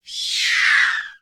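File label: Pterodactyl full shriek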